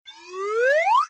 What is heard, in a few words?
cartoon slide